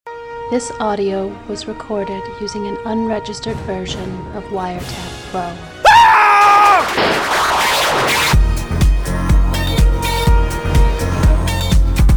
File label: More Noice Noise